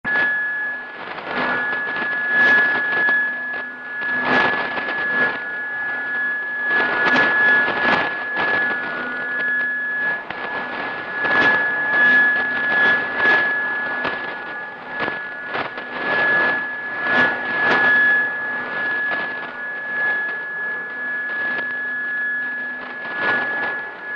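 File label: Static Two Tone Flutter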